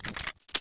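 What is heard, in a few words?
machine gun holster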